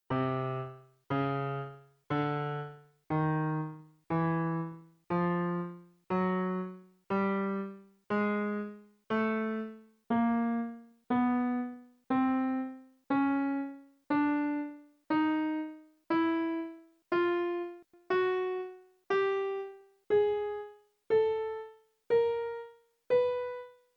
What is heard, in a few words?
snd Piano